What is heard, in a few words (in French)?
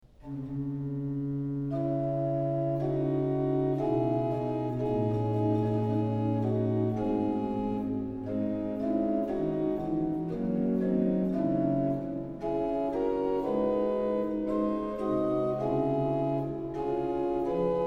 Recit de Chromhorne; F. Couperin